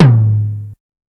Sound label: Tom, dx